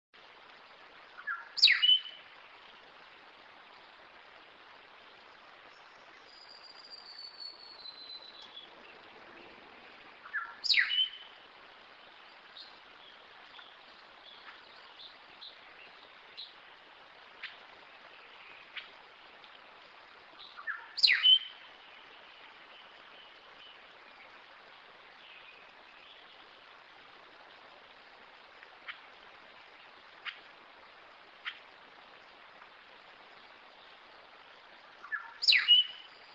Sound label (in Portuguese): canto do tecelao do acre